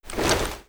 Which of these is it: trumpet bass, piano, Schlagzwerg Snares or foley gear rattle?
foley gear rattle